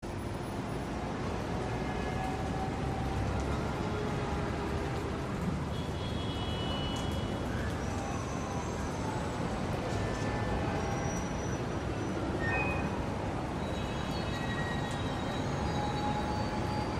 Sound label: City Night Amb; SpiderMan